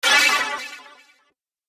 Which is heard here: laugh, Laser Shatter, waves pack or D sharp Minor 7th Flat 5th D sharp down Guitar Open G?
Laser Shatter